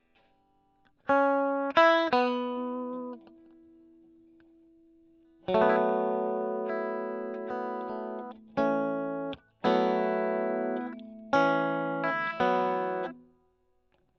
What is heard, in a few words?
E. GTR Gefell